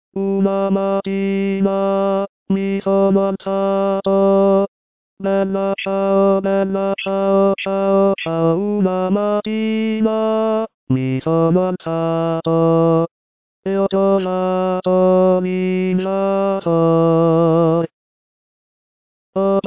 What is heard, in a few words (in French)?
Baryton; Bella Ciao